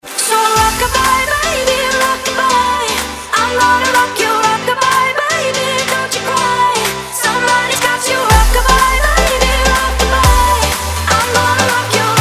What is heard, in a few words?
BEAT